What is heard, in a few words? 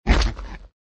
Heavy Twins Land